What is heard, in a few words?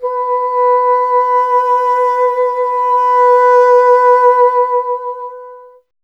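wind bassoon B; Miroslav Vitous; Solo Instruments VOL-1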